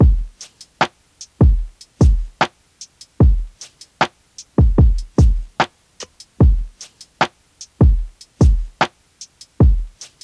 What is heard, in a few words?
Drum Loop, 75 BPM; Cymatics; Eternity Sample Pack; Full Drum Loops